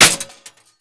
metal; Mafie 1

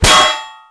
metal; Mafie 1

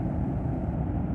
ambi in; Mafie 1

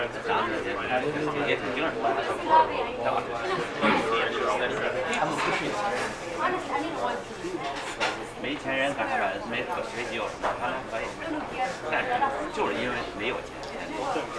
intro ambi bar; Mafie 1

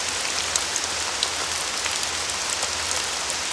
ambi rain; Mafie 1

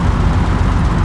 truck; NPC; Mafie 1

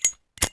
fuse; Mafie 1

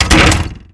metal; Mafie 1

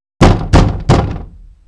door; Mafie 1

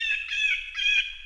bird; Mafie 1

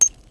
gravel; Mafie 1

